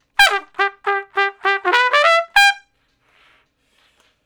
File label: Trumpet Shuffle (G); Crimson Blue and Fabulous Horncraft 4 RnB; Horncraft for R&B; Solos